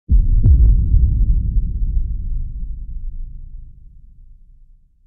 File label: boom; franks wear llc